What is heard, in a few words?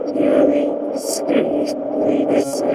radio number station terminator synthy